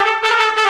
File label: trumpet danger; music; Left 4 Dead 2; Source Engine; zombat